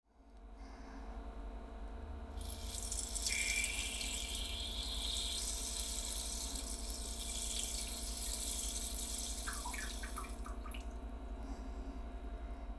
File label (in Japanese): 吐息とおしっこ; 生撮り！; 盗聴; 吐息あり